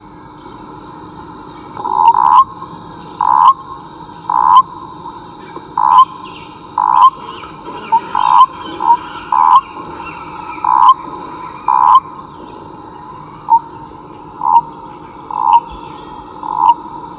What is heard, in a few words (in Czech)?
Ropucha obecna; zabi; ropuchy; zabi stezky